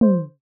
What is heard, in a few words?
speech bubble soft pop; daily life